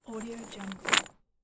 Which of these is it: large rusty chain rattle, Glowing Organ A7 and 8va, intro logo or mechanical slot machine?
large rusty chain rattle